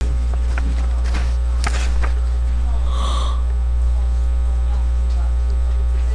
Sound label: cry